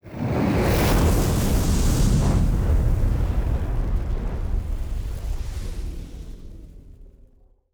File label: rift event conflagration; astral planes; rifts